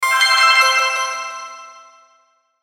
Logo Bells Arpeggio Fast Delay, Logo Ascend Filter Delay; Sonic Logo Sound Effects; Production Element Imaging Element Accent Transition